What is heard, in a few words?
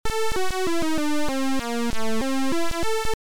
Title, Beeper; Rana Rama